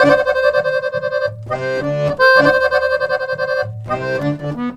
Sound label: Balkanish Traditions; USB Soundscan vol.63; AKAI